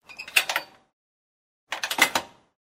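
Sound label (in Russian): насадка на насос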